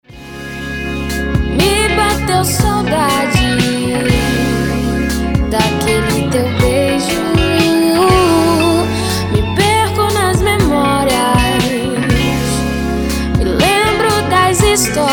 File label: LATIN POP